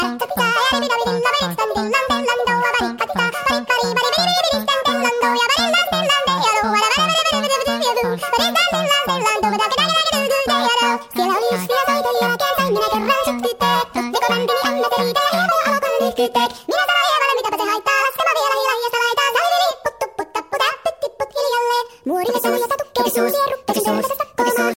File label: Chipmunks